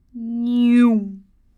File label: Motorcyle Nyommm